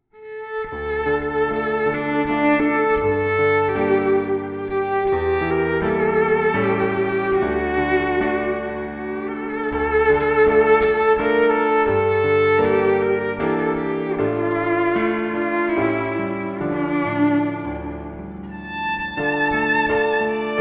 Violin Piano; Acoustic Duo

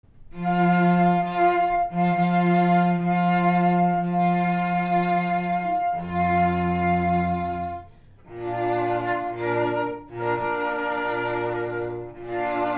slow wedding march, String Quartet; Elegant Ensembles

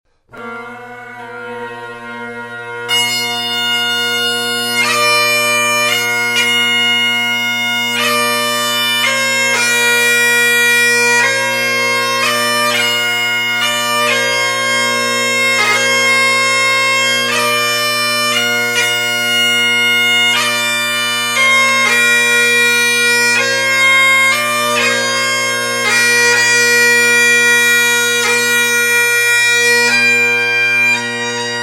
Great Slow air for memorials, Bagpipes

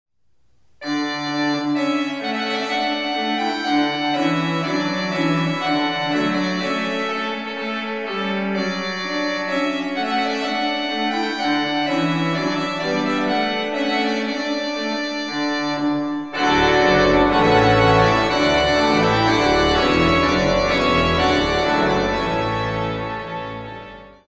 prince organ, Classical Duo

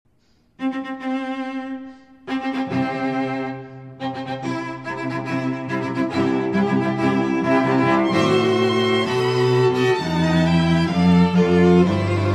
Bridal Chorus, classical quartet

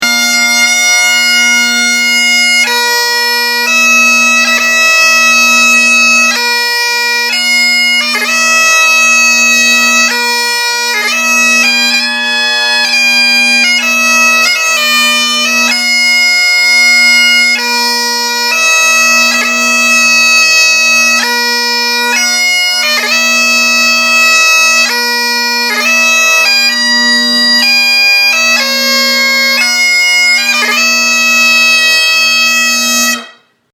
Great Highland Pipes; bagpipes

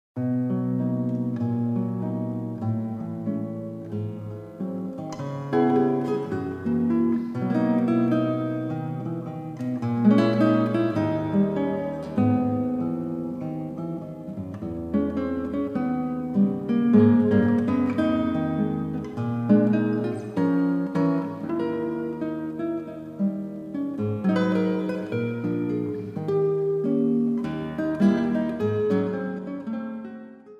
classical guitar

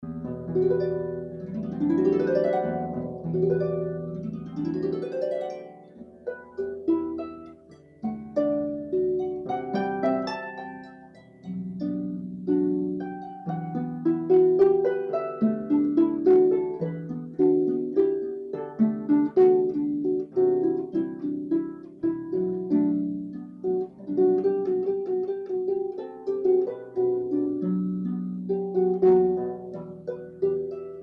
harp

